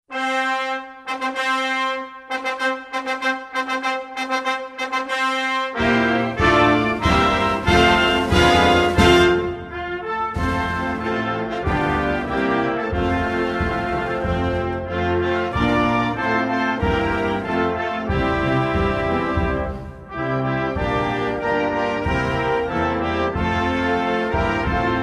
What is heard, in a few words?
National Anthem of Guatemala; US Navy Band